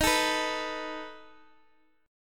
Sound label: A Suspended 2nd Flat 5th